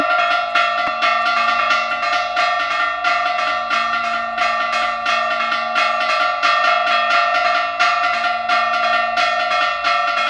Campanas; LaCorrada; Julio

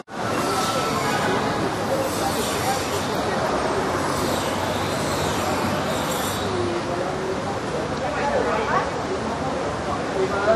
Plaza De San Miguel; oscardeavila

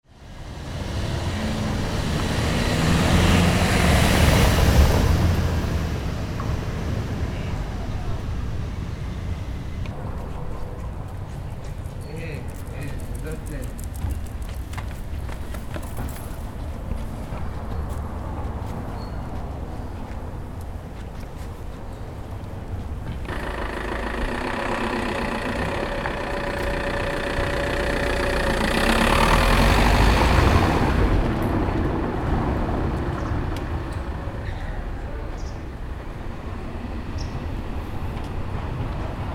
pajaros en furgoneta

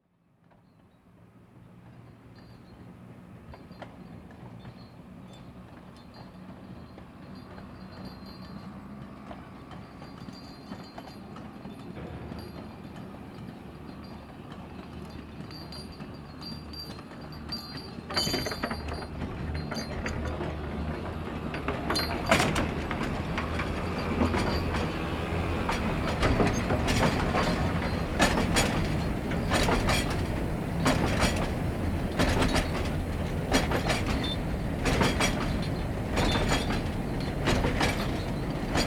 Tren lleno; MindRevolution

